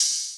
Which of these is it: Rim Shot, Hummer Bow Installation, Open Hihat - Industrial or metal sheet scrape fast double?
Open Hihat - Industrial